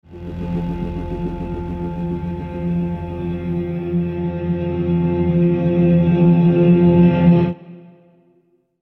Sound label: Quick Scene End